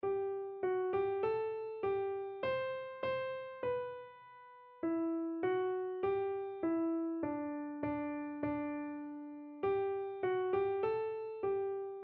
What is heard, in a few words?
dix, keyboard